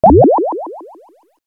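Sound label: liquid bubbling short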